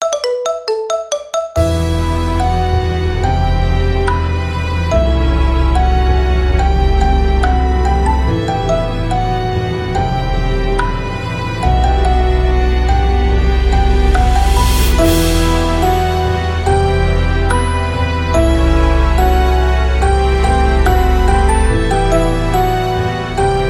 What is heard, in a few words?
marimba remix